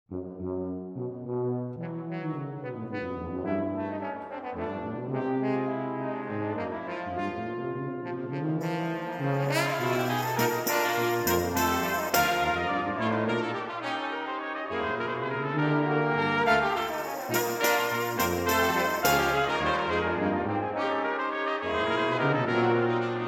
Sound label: Armenian Scenes, Drinking Song, Brass Quintet; Atlantic Brass Quintet; A Musical Voyage